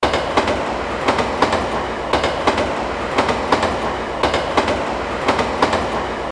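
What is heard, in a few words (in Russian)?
звук поезда эффект реверберации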